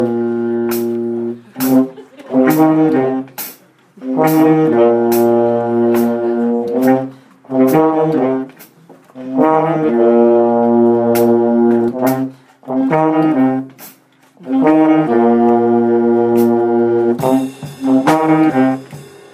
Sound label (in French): Nouveau Chant Dimanche, brassband